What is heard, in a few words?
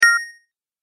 Click Sound